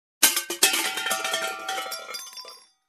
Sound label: Fallen Steel Cookware Sound